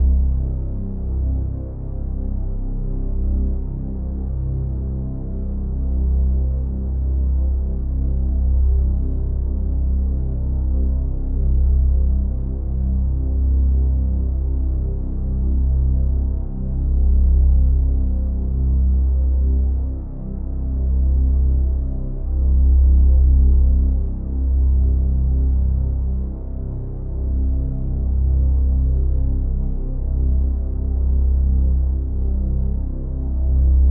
Bass Drone, C, Instrument Drones, Ambient Textures